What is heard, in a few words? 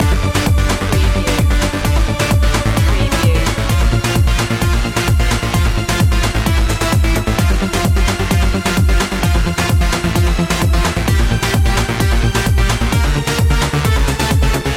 electro symphony loop